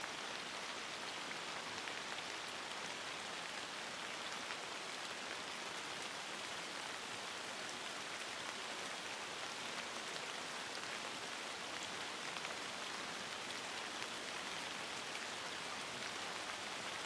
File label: Light Rain; Calm